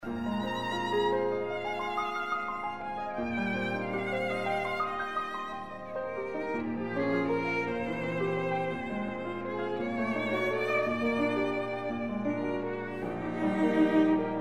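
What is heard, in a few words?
piano quartet; bridge; phantasy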